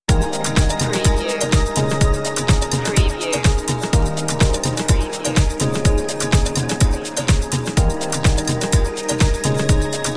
moving and grooving dance party track with a slight Latin sal; chillout grooves